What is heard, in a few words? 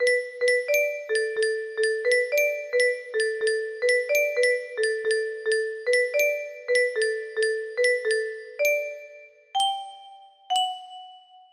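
music box, music box melody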